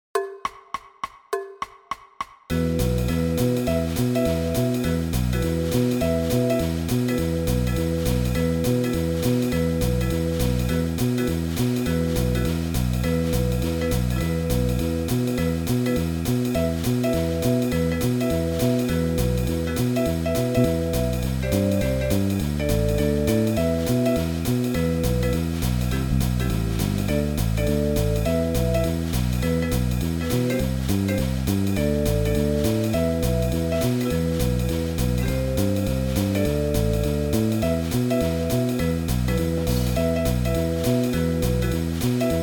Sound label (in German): Sommernachtblues; Play-A-Longs